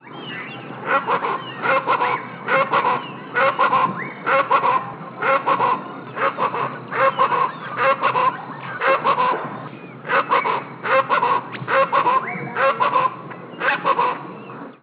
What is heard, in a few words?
chachalaca, birds on the Costa Maya; aves, buds, berries, nuts, insects, and human handouts. Where they are not protected, they are hunted as game.